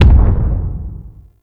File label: Arachnoid Camper Distant Step; Warframe; Corpus